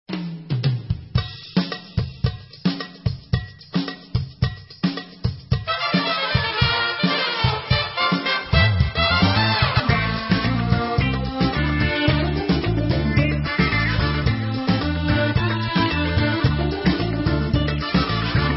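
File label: Instrumental, Israeli Dances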